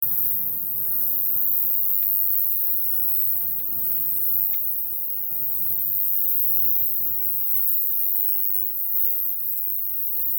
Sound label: nocturnal insect